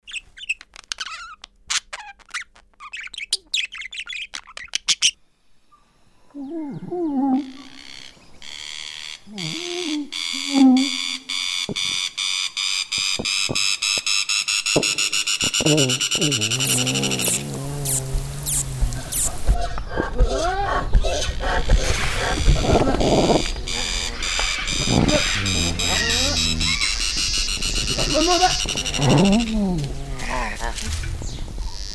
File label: Coolest Soundscape; IBAC